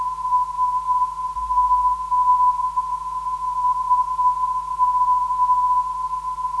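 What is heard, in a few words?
377.4kHz, non-directional beacon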